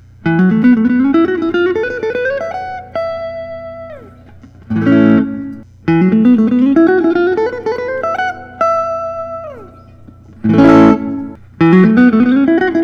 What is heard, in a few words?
fender custom shop; jay black deco